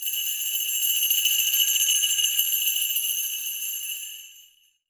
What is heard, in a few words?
GIANT S, TREE BELLS; Voice Crystal - Steve Reid Definitive Percussion